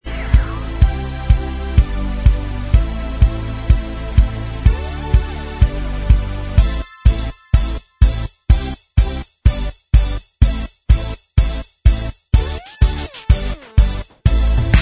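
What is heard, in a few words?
Instrumental Track, backing tracks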